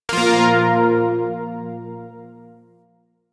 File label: Shawzin Chromatic Chord; Zariman Shawzin